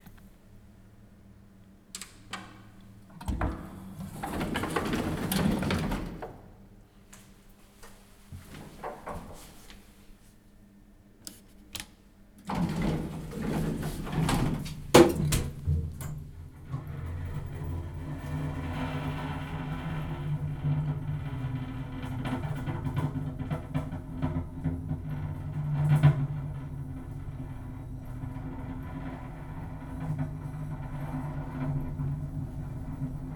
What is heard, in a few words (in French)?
ascenceur; Ville; ZoomH2N